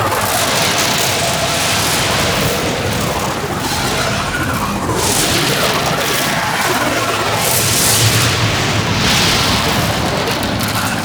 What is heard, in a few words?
Void Fissure Loop; Warframe; Entrati; Conquest